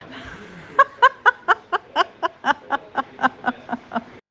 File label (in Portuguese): risada